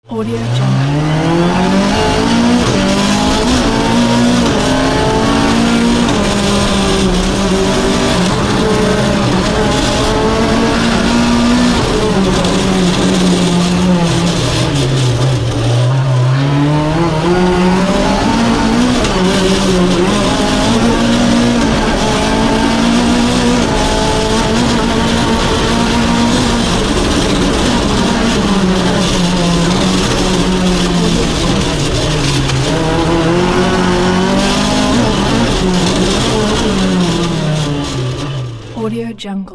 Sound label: roar the sport car